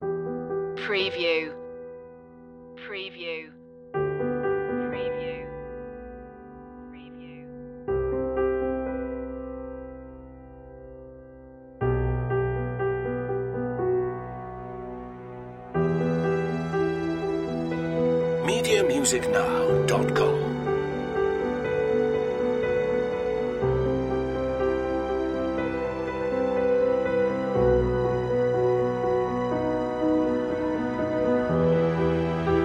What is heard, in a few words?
grand hopeful resolution